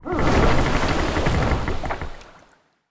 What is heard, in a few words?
alarm fishboat; ships siege